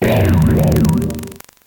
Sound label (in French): Cri